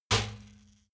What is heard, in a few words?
trash basket metal hit soft